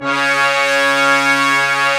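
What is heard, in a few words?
Brass Ensemble mute; Roland LCDP06 Brass Sections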